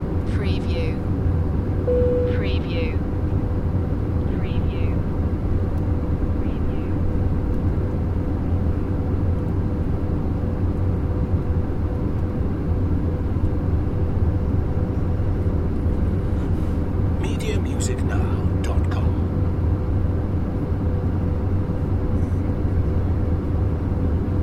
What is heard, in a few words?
Room Tone, Aircraft Interior, Page Beep, Very Little Walla or Movement